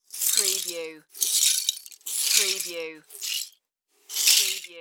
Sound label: Debris Plastic Sweep